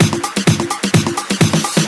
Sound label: Fill Ins 128BPM; Vengeance Samples; VEH2 Loops